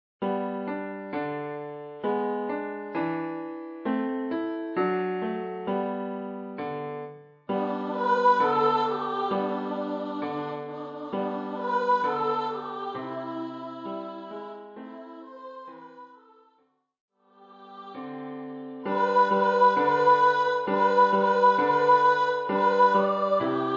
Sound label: Jingle Bells